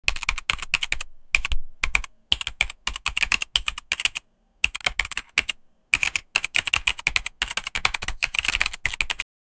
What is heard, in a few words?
keyboard clicks; cloudy